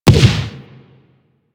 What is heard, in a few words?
Shrapnel Shotgun Fire; Railjack